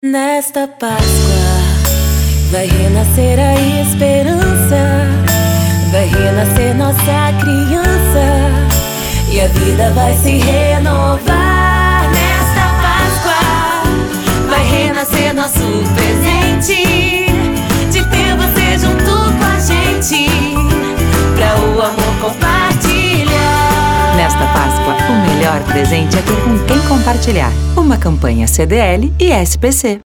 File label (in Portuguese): Jingle Páscoa com assinatura